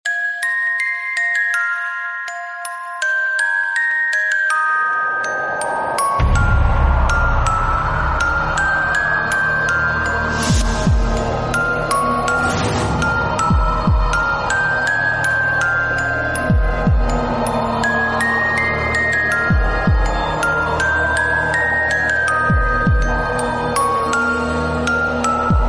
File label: hindi tv serial ringtones